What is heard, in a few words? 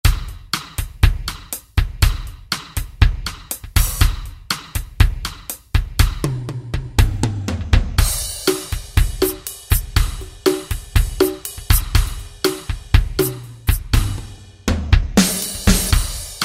Piano Bar, Alesis SR-16